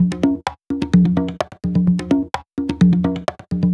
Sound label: Minimal Loops